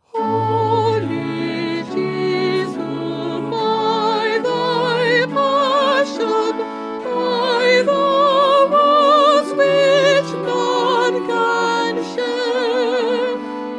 Soprano; Stainer; Crucifixion

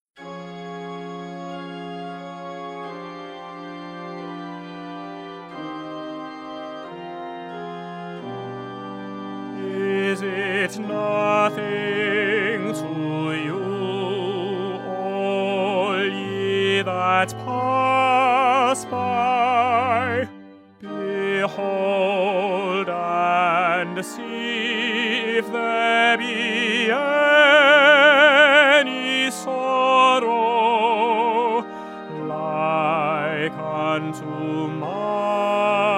Concert Version

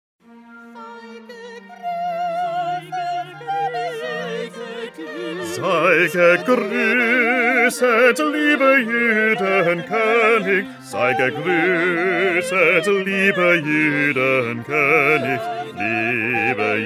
Bass; Bach; St John Passion

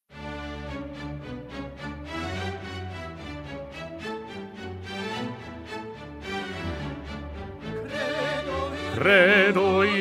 Bass; Haydn; Nelson Mass

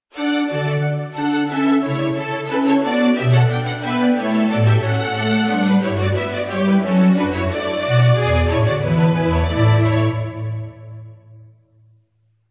Instrumental

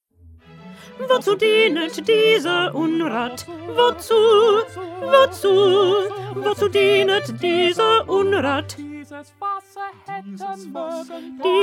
Alto; Bach; St Matthew Passion

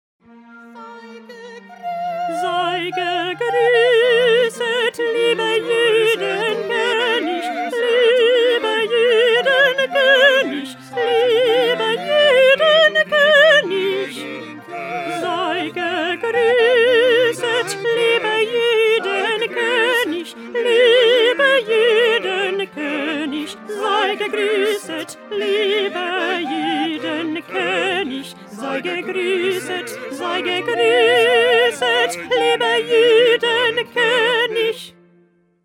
Alto; Bach; St John Passion

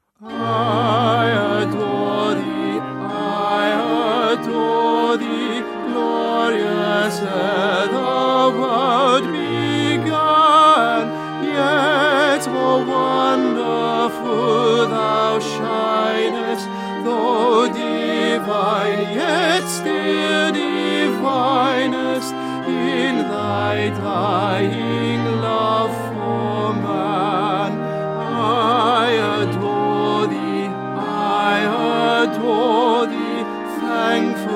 Tenor; Stainer; Crucifixion

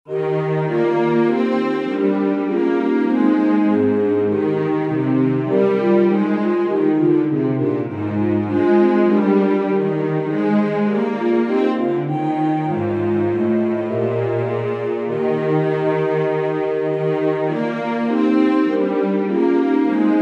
Instrumental; Bach; St John Passion

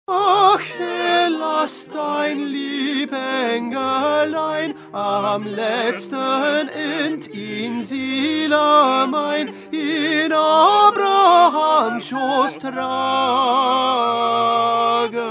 Tenor; Bach; St John Passion

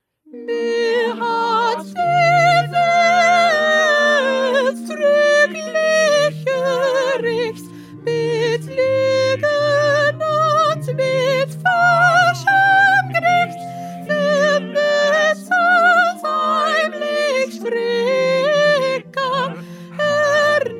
Soprano

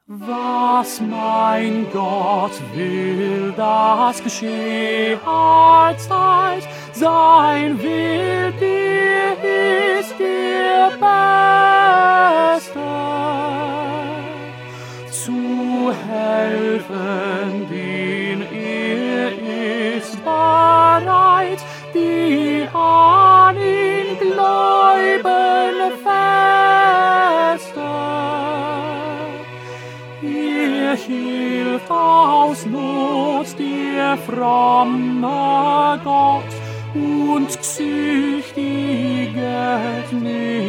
Tenor; Bach; St Matthew Passion

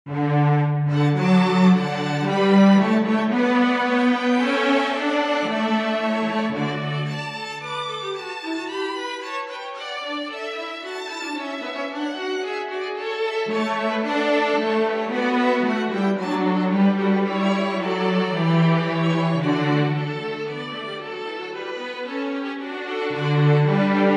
instrumental; Handel; Messiah

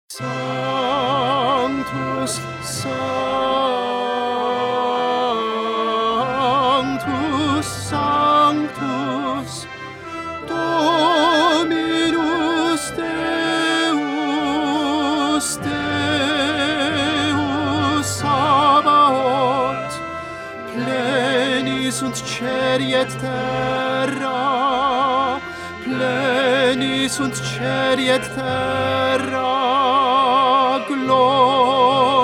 Tenor; Mozart; Coronation Mass

